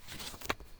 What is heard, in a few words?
page flip